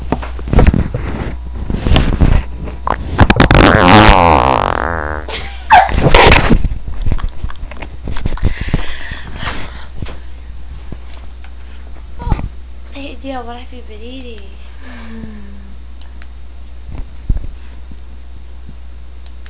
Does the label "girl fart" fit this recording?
Yes